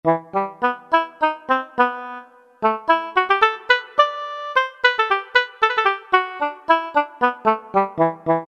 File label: OBOE; Roland SN-U110-06